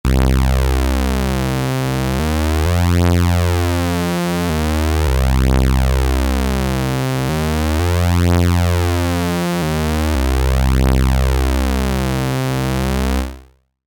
Osc mono

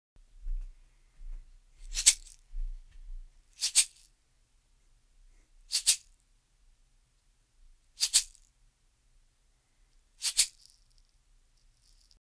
Soyuz the Launcher noise dry

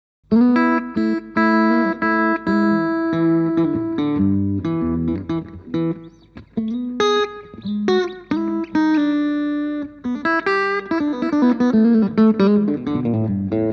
blackface

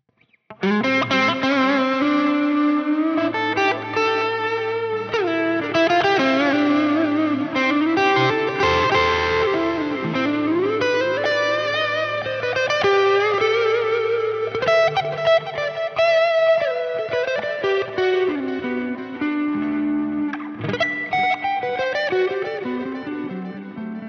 Neural DSP Soldano SLO 100 Broken Clean

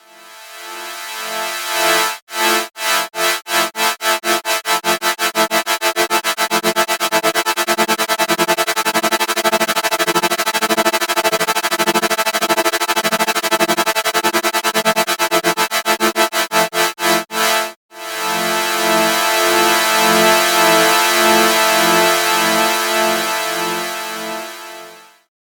LFO maximum; Waldorf Kyra

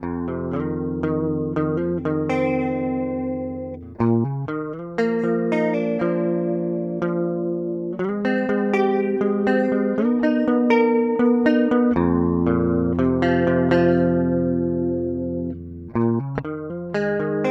Wampler Terraform Stereo Chorus

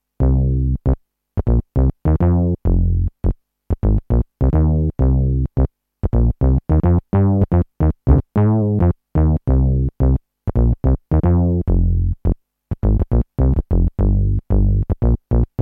TX7 Syn Bass

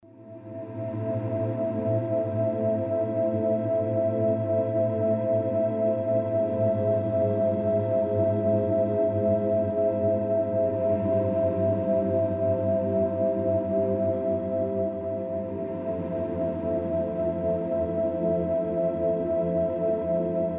Meditation Pad Gentle Darkness; NADA

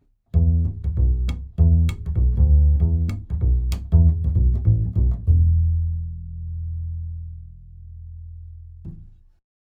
Upright Bass; Austrian Audio OC18

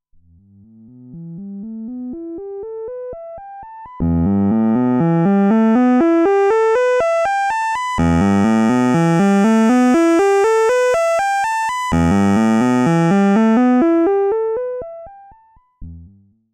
vcf1 LP sweep Res 0

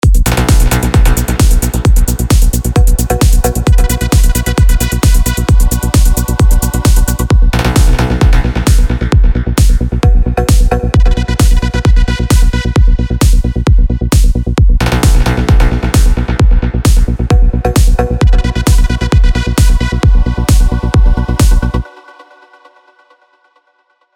Avenger 2 Melodic Techno; Vengeance Sound; Factory 2

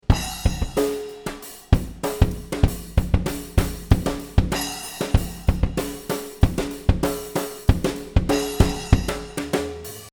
Beat

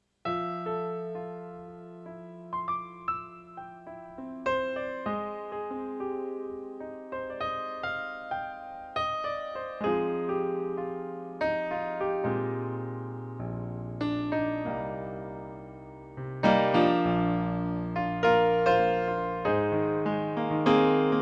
Piano

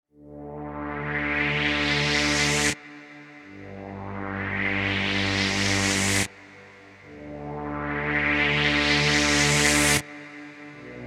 SH-4d Model Juno 106 Swell

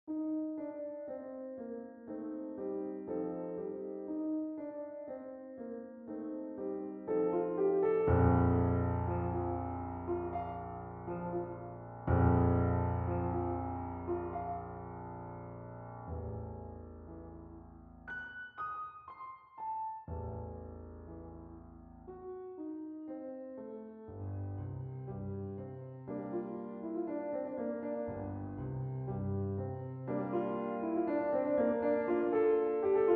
960 beefy Hall Mini Grand; Redline

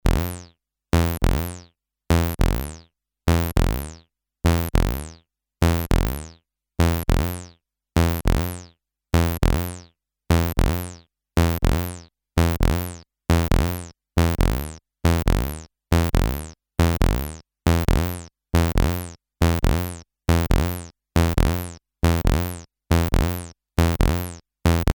TD-3 Slide Time

